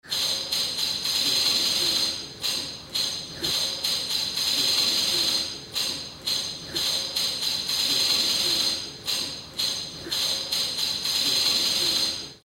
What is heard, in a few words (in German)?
Tamburin